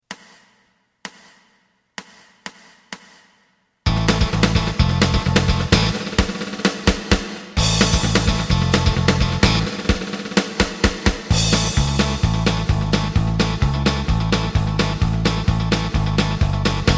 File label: lead